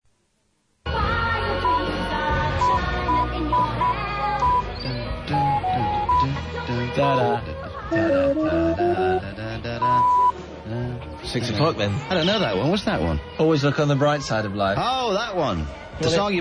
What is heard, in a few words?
pips tune; bruno mayo